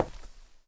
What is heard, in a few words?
footsteps step